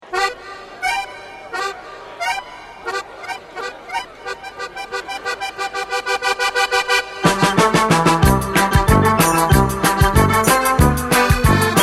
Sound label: Electronicas